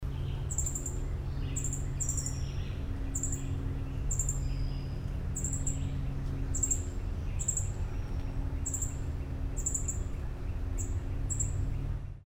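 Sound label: Northern Cardinal, Tuckahoe, Caroline, Maryland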